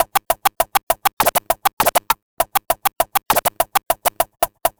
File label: Vinyl Scratch Snare